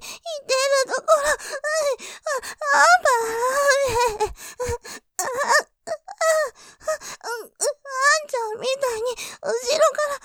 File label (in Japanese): 喘ぎ; イッてるところをパンパン追撃！ワンちゃんアタック！; ループで楽しむ！シェフの気まぐれ抜き抜きコース; モノラル